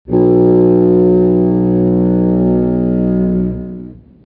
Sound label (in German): Horn